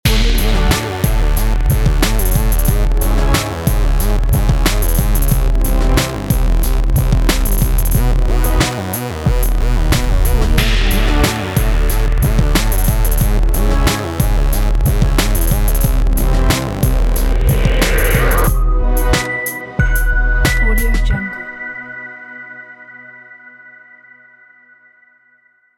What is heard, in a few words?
synth logo